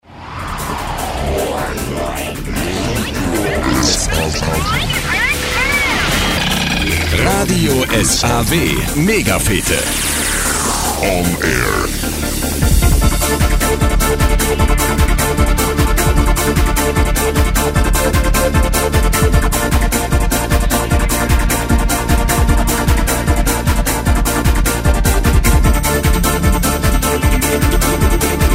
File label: saw trance; Musikbett mit Drop-In für die (ehemalige) Hörfunksendung "Megafete mit Warren Green" bei radio SAW